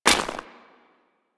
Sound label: Step Ice, footsteps